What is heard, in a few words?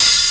smooth open high hat d sharp key